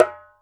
Ashiko Na; Multisamples; Digital Sound Factory Planet Earth

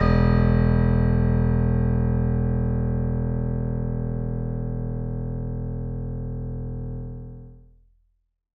Acoustic Piano; InVision Interactive; Miroslav Vitous; PIANO STEREO

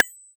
Synth Rim One Shot; Drum & Bass; One Shot Drums; Sonic Foundry; Futurist Drum & Bass

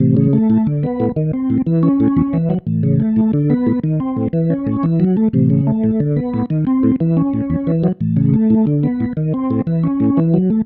Pattern, Organ; Loops; Sonic Foundry; Joe Vitale Organ Donor

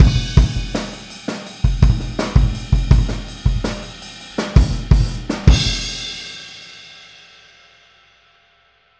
Boogaloo - Outro - Kick, 82 BPM; Sonic Foundry; Drums from the Big Room The Mixes

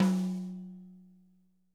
tom, drum

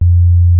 SINE-WOOF F2, ANALOG BASS; Music Store - Claudius Diemeter Schwerlos

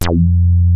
WEST HARD F2, ANALOG BASS; Music Store - Claudius Diemeter Schwerlos

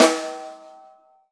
RAW SD, Drums Acoustic; AKAI; 90s sample CD; USB Soundscan vol.10; RAW KIT 3

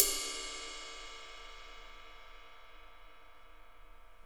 RIDE 2 LO 2 R, Interactive Drum Kits; Sonic Reality

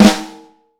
FLAM, Vintage Snare; 6.5X14; Q Up Arts; Johnny.C's Vintage Snare Drum

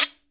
GUIRO, PERCUSSION; Sonic Reality; Interactive Drum Kits

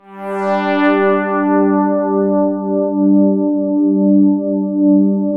5TH HARD G2; SPACIOUS; Music Store - Claudius Diemeter Schwerlos; SPACIOUS 1

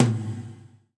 GATE TOM, Drums Acoustic; USB Soundscan vol.10; AKAI; 90s sample CD; GATEKIT 1

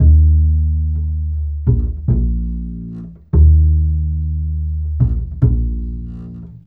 Acoustic Bass; Sonic Foundry; Leo Cavallo Bass Taster

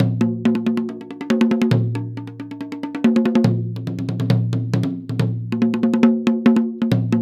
PERCS 140BPM; INIS; Opium

